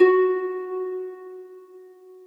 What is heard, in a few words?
harp